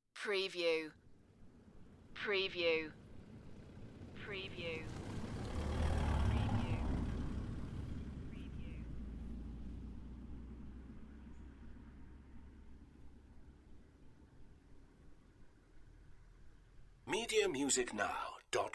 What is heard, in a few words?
Farm vehicle and trailer drive by on country road in Wales; beanstalk audio